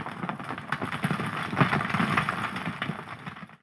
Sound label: building rubble, streetwar